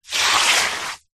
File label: wet sand deep hand dig in; foley; zapsplat